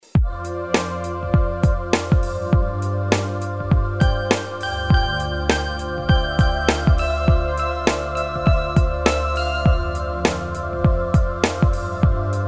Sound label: ocean wind